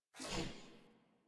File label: UI Corpus Theme Window Open; DE; Warframe